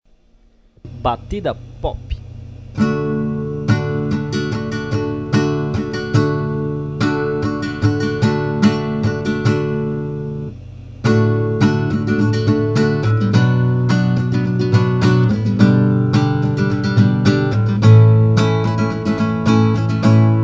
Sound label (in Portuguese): Batida Pop